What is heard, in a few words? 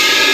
Cannon Travel Launch; SNES; Secret of Mana